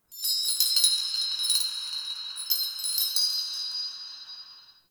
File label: Metal Chimes; Warframe; Ambience; Nokko Colony